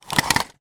household medicine tablets pack foil blister pack slide into box; zapsplat